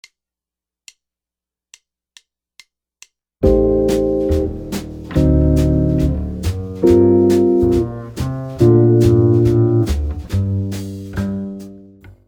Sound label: easy jazz chords